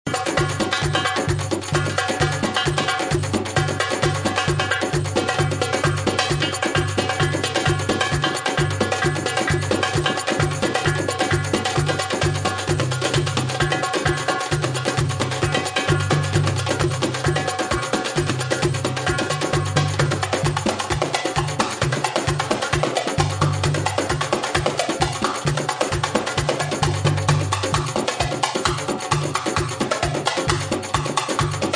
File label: drum solo